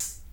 soft sounding pop foot hi hat sound D key; hat samples

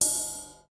cymbal crash sound c key

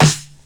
sizzle snare sound D key; snare drum samples

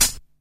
clear high hat f key

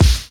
bright bass drum one shot a sharp key

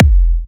kick drum single shot f key

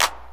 old school hip hop clap single shot D key

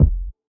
fat kick single shot f key; kick drum samples

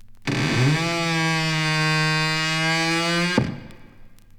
coffin lid closes; dracula

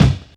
studio steel kick drum c sharp key

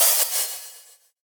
sizzle open hat c key

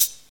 juicy hi hat one shot f sharp key; hat samples

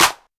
hand clap sound e key; percussion; single hits one shots

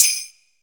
shaker sound sample D sharp key; percussion

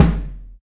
reverb kick single hit a key; kick drum samples

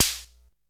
subtle reverb snare drum sample a sharp key

